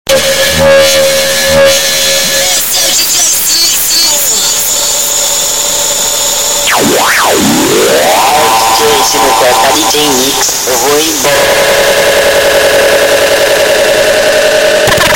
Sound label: Montagem sped Up